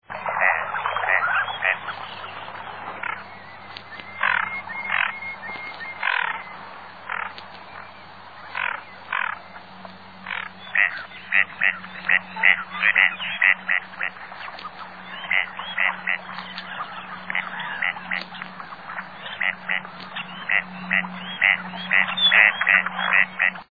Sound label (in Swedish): snatterand